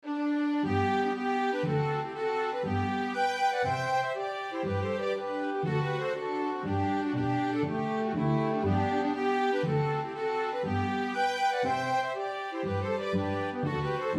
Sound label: Three Tradition a Hornpipes